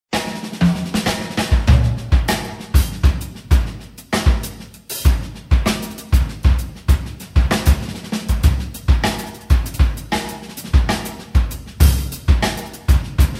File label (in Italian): assolo